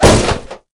crystal drop

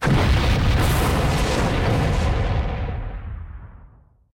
other destroyed, ships combat; CosmicRage

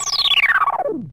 holovid off, ships combat

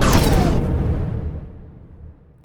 you hit; CosmicRage; ships

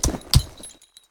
walk, horses; CosmicRage; CosmicRageSounds

